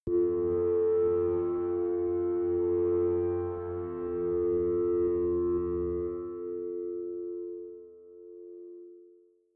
sunrise

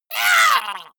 monster hit high; schreie

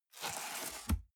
cardboard box open scrape; objekte

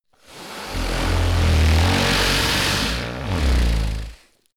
monster snore breath low; koerpergeraeusche; comedy-cartoon; soundpool

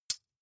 midsize metal thin hit; industrie-wirtschaft; baugewerbe

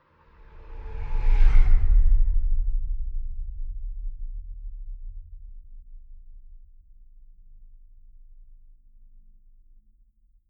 spaceship small flyby; raumschiff

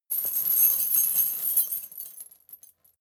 metal chain midsize handling slow

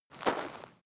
step gravel single; schritte; menschen

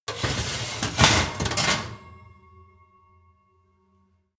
oven baking plate slide in; gastronomie; berufe

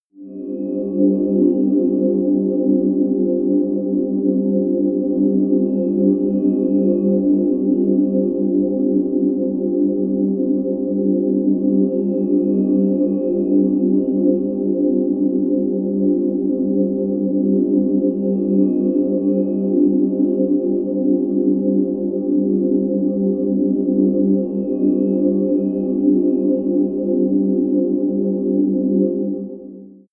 space stars, music elements

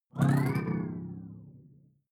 sci-fi alien big bionics up; swooshes-swishes

